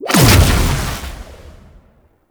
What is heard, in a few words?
Safe Zone Activate; Warframe; Nineteeen Ninety Nine; Deep Archimedia